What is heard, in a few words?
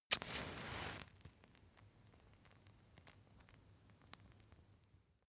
match light